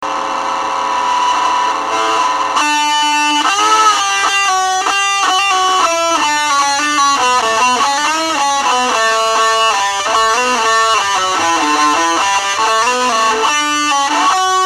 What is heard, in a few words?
Solo no drum version